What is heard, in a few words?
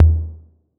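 808 low tom; Wizoo - Platinum 24 Electronic; CHR TOMS 808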